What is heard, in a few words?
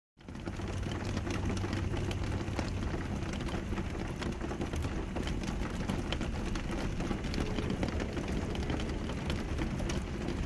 Heavy rain hitting window